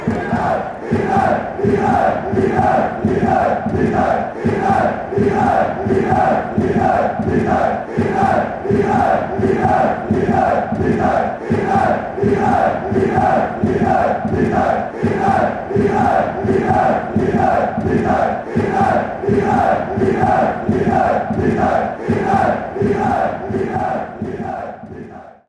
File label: hera